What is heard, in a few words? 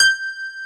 ELEC GRAND, JV 1080; Pro-Rec - Synthsonic